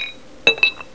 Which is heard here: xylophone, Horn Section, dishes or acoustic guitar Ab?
dishes